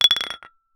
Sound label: single plinko ball landin